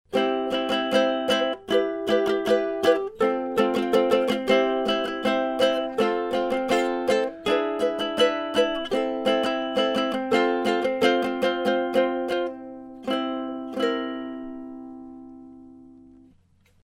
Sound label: rode broadcaster ukulele